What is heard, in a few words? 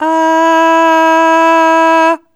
Aah Short E3 Dry